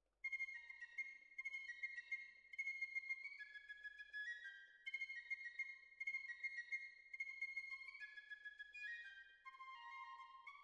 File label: Cellos Over